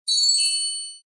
ding

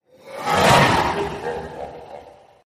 skill bullet; graveman

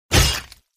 sound attack hit; hitsound

